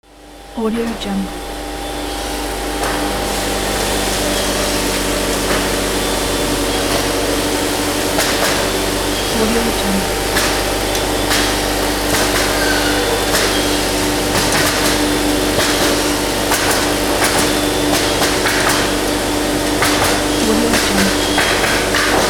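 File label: industry factory ambiance; alexm76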